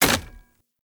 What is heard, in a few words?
Polearm Medium Impact Wood Hollow Large; Weapon Impacts; Player; Environment; Sphere Polearm